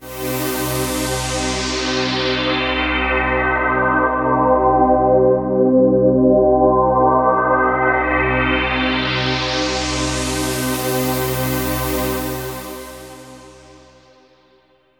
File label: synth pad ambient 160 C; Sphere